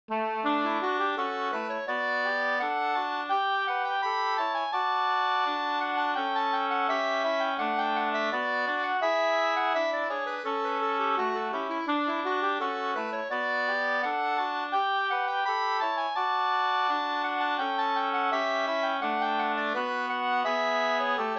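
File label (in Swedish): brudmarsch Brudlat Jämtland 3 stämmor; Anders i Logarn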